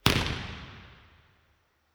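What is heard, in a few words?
courtyard recorded impulse response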